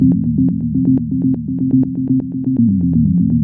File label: lowpass bass echo